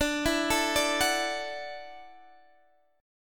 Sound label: F sharp Minor 7th Sharp 5th D